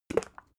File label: bottle syrup upend soft; berufe; gastronomie